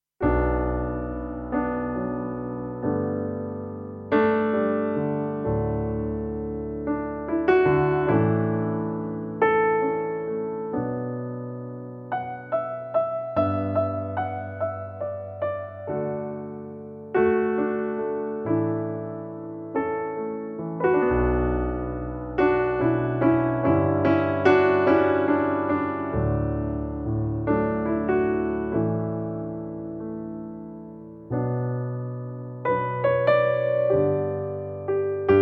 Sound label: Piano Version